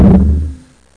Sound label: logo; robotron